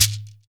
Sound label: SHAKER, Heart of Africa; Spectrasonics; Heart of Africa 1